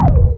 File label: Sound Thrust